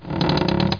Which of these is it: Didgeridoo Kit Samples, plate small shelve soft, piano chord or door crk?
door crk